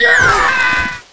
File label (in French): chute skieur masculin